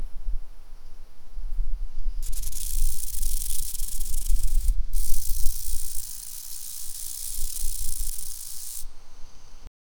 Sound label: a plant is growing from